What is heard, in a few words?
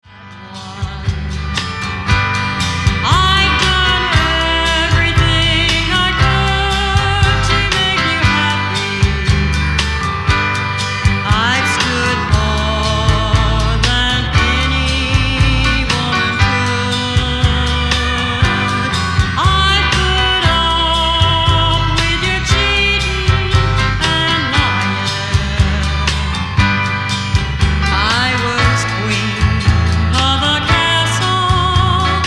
Vinyl 1960s Country Music